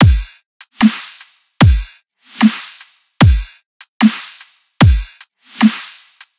Dubstep Drum Loop Full, 150bpm; Full Drum Loops (Stem Seperated); Ghosthack; Dubstep Sound Arsenal

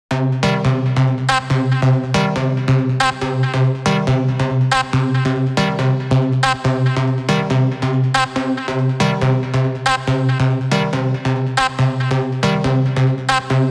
Full Mix C 140BPM, Rave Melody; Techno; Rave Melody Kits; Ghosthack; 7 Free Rave Melody Kits 2025; Kit Reasons

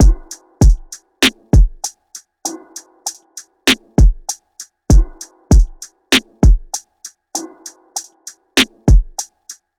Drum Loop 98 BPM; Trails; Cymatics; Vibes II Drum Loop Collection

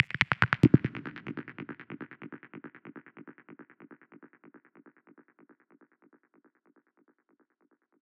Squelch A 142 BPM, Psytrance; Ghosthack; Psytrance Visions Volume 2

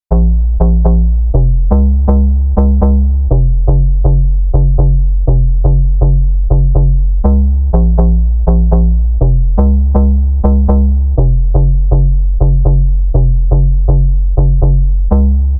Donk Bassline, 122bpm A#min; Construction Kit; Ghosthack; Summer Freebie; Acapella Kit

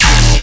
Bass Hit D#, Dubstep; Abyssal Rumble; Ghosthack; Demolition

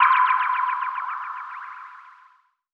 SYNTH (C); Cavern; Cymatics; Mystery Pack Vol 4

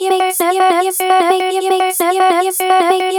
Vocal Loop 150 F#; Trap and Dubstep

